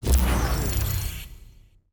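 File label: match fail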